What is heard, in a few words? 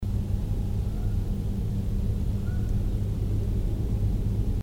Whoops unfiltered